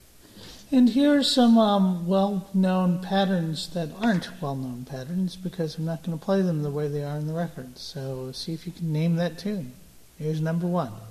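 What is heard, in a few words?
strum pattern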